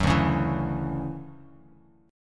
D-Major 9th D down Bass Standard

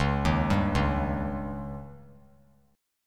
C sharp Suspended 2nd Flat 5th C sharp; arpeggiated; JGuitar

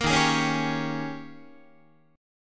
Eb 11th, Guitar; strummed; 13,11,11,11,11,12

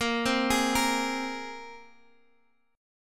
Bb 7th Suspended 2nd, Guitar, Standard; x,13,10,13,11,x; Bb7sus2 arpeggiated; JGuitar

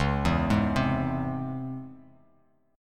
Db Minor Major 7th, down, Bass Standard; DbmM7 arpeggiated; JGuitar

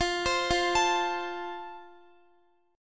F Major, Guitar; F arpeggiated; x,x,15,17,17,16

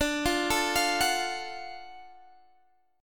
F sharp Minor Major 7th Sharp 5th D

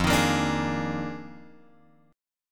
F Minor 13th, guitar chord; Fm13 Chord {1 X 1 3 3 4} strummed

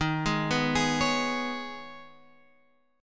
D sharp 7th Suspended 4th Sharp 5th; D#7sus4#5 Chord {11 11 9 X 9 9} arpeggiated

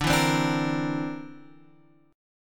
D 7th Suspended 2nd Sharp 5th D

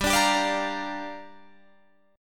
G Minor 7th, Guitar, Drop D

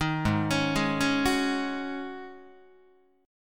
Ab 7th Suspended 4th Ab down Guitar

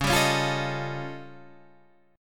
Eb 9th Flat 5th

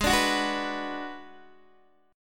G sharp Minor 7th Flat 5th, Guitar DADGAD; x,11,12,11,9,9

